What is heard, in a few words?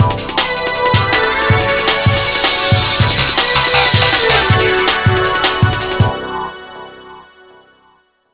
Drum 'n bass